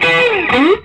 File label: SOLO E, Vintage Blues Guitar; USB Soundscan vol.22